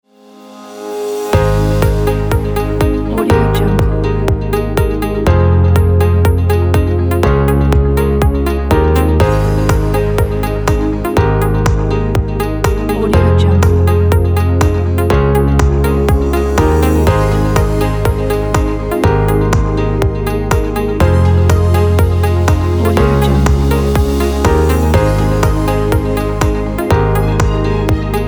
fine dynamic

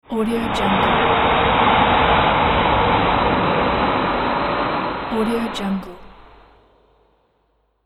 sound design afterburners engaged

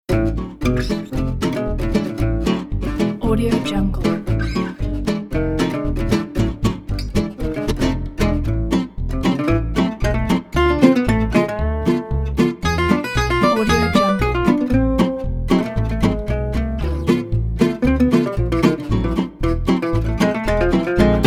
gypsy jazz manouche

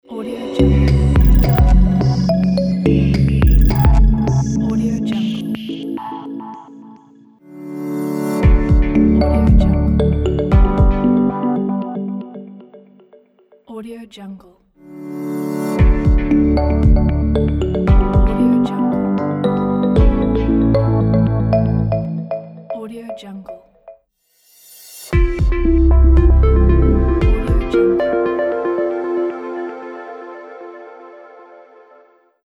minimal logo pack

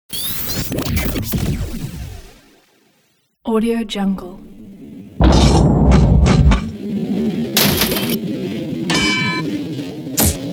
radio jingle ident

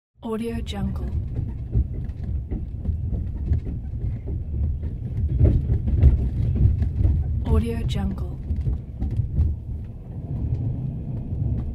sound inside moving train

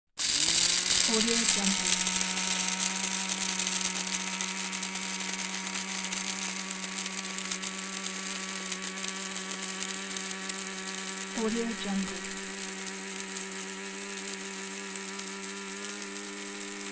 coffee grinder; moonduststudio

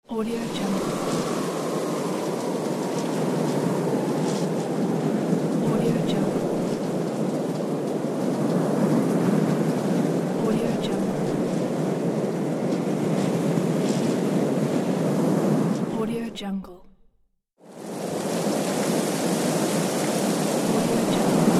rain and wind

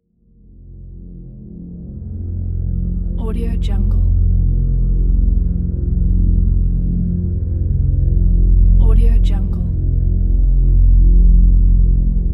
drone

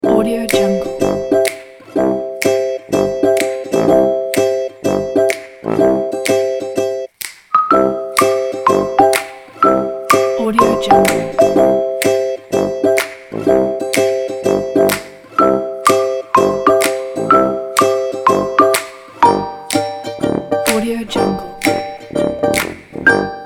naughty little orchestra

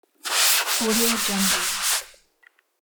dry chalkboard erasing

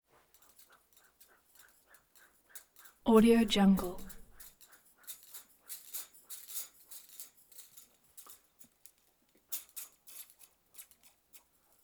slinky spinning in circles whooshing rotations; prosoundfx

